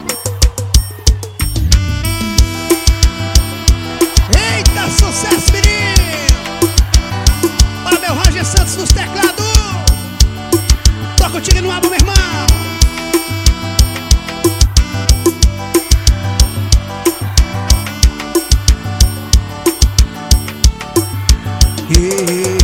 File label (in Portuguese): forro; bruno gravacoes; m audio delta asio analog out 1 2 delta 1010lt